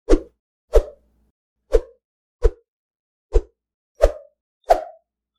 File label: Whoosh Sounds